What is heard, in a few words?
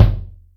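Bass Drum, Acoustic Kick; Yamaha DTXpress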